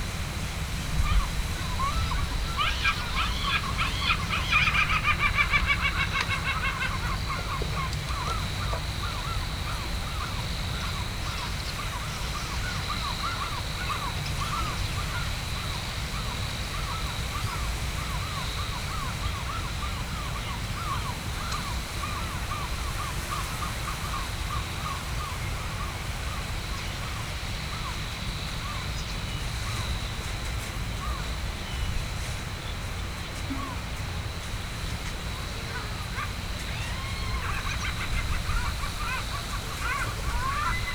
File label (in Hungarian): sárgalábú sirály halastavak; hortobágyi nemzeti park 2013